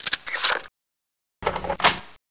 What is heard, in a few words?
awp reload, weapons; svencoop